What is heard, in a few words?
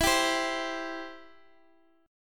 E Minor Sharp 5th, Guitar; Em#5 strummed; JGuitar